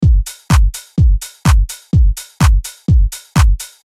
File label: Drum Loop; BIJOU; UNISON